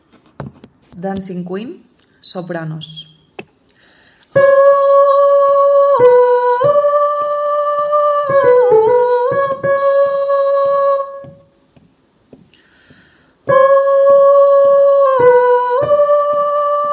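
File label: soprano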